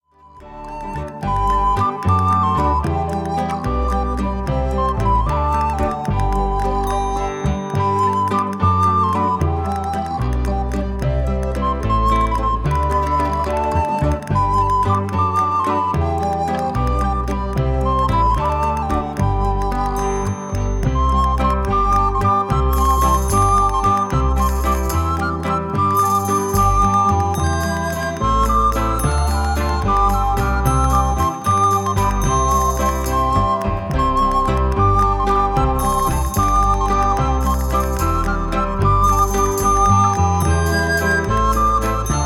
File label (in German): Instrumental